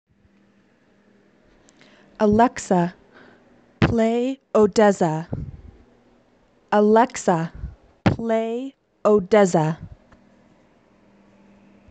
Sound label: morning music